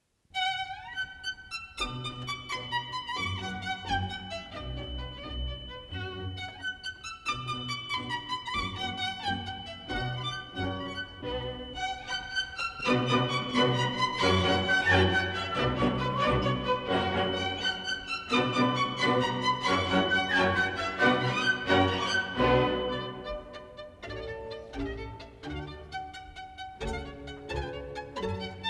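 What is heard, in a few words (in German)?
Konzert Für Violine Und Orchester Nr. 2 H Moll Op. 7 La Campanella Rondo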